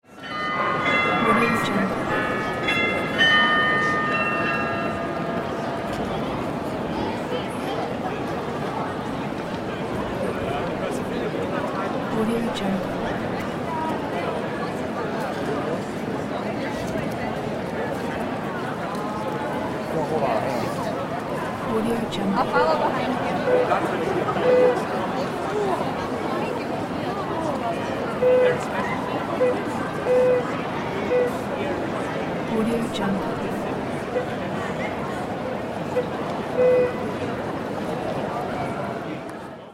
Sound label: city square ambience